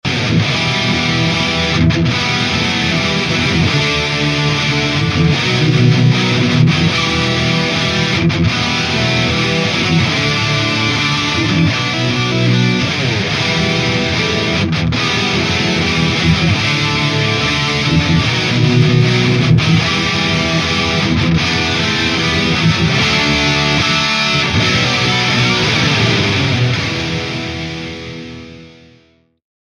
mesa boogie mark iii suhr reactive torpedo cab effects; vfe dragon